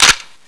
blaser foley

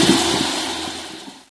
poison gun shoot

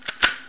clip in

